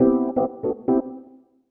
SYNTH HOUSE 125 A, Keys; eJay

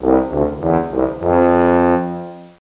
Tuba

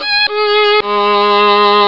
Fiddle; DeluxeMusic2

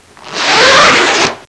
zipper up; Sound Effects for Multimedia - Volume 1

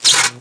ZZZAP; DarkOmen

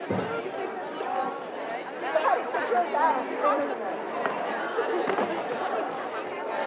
Ambi, indoor; Atennis

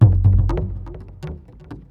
PERC LOOP ETHNO 125; eJay

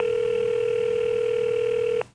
connecting; Gadu-Gadu

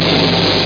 Spark; Total Distortion

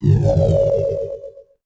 NOIZ; thief

